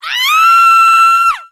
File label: Girl Scary